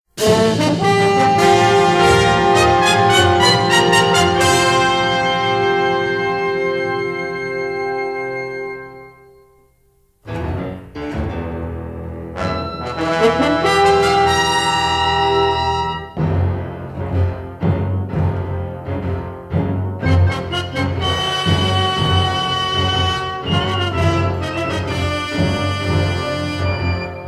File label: Musical Theme; Ship Capella IV